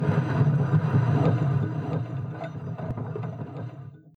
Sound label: moving